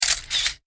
photo shutter; Poodletooth-iLand

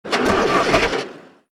KART Engine start; Toontown Online

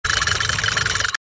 cannon adjust; Toontown Online

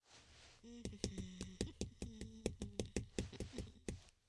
avatar emotion bored

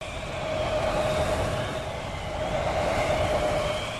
whirlwind

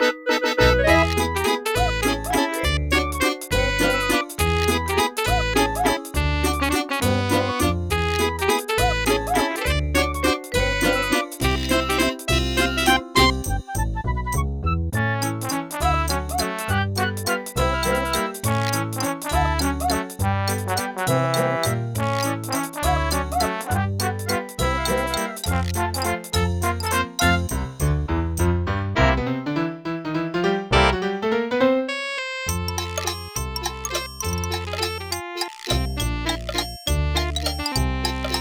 party waltz dance

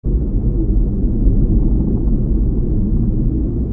ambient water; Toontown Online

